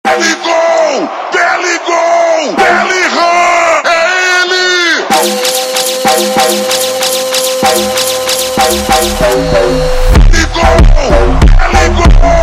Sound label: slowed